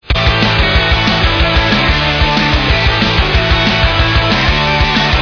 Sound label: loop; two fingered salute